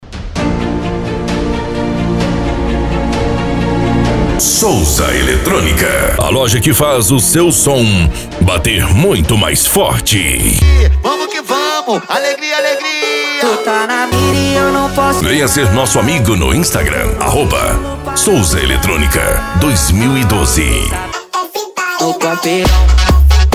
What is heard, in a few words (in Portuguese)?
edicao automotivo; eletronica souza